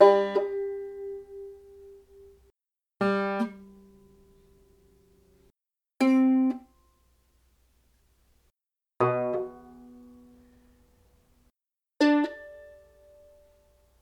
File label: instruments